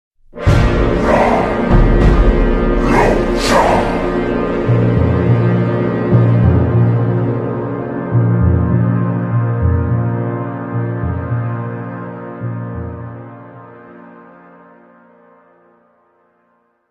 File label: orc; wow